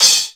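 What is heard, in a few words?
CRASH LOOP, DRUM KITS; InVision Interactive; 40 Oz Phat Slammin Hip Hop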